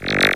elektronnij zvuk kvakaniya

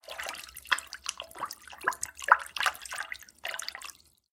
voda bulkane spokojnij

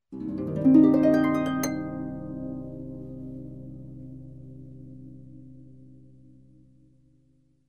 zvuk arfi minornoe arpedzhio vverh

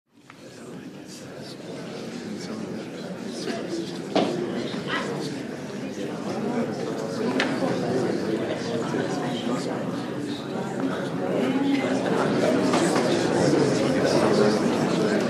atmosfera zala suda tolpa shepot lyudi vstayut

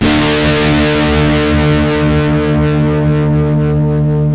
gitara s distorshenom odinochnii akkord yarkii

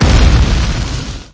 vzriv upavshego snaryada

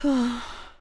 zhenskij rasslablennij stonushij vidoh

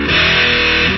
gitara interval s drajvom

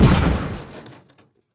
hlopok ot padeniya zheleznogo dvigatelya

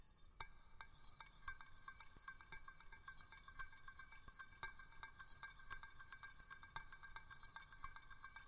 mini partitura sozdannaya zvukom skrezheta metalla po krayu steklyannoj vazi

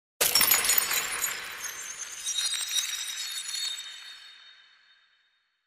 zvukovoj effekt nebolshoj ledyanoj magicheskoj ataki

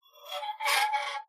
skripuchij metallicheskij tormoz

